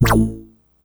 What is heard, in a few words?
Synth Hit G